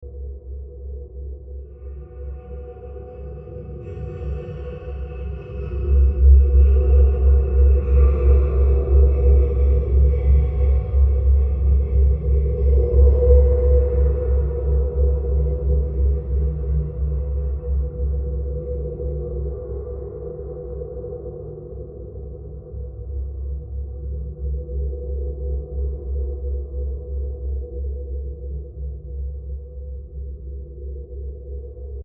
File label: Scary Ambience 120bpm; Scary Soundscapes; Horror; Sound Effects:Horror; CraftedSignals